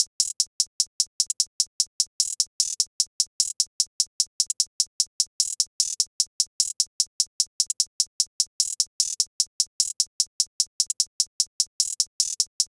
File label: Hihat Loop 150 BPM; Black Card; Cymatics; Fiji Hihat Loops & Percussion